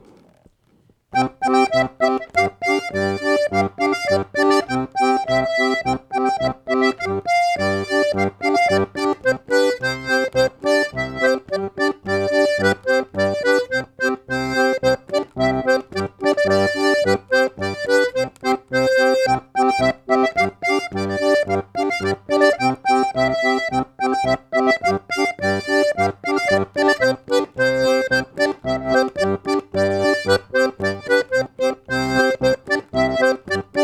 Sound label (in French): polka